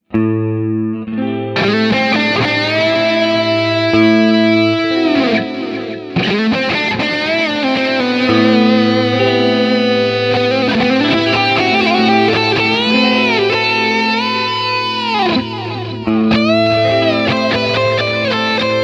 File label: Jazzy and Solo